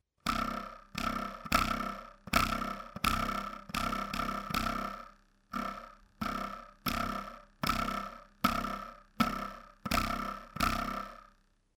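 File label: ruler sound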